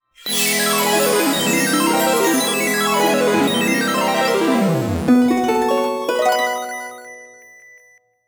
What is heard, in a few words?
Fanfare Instruments Get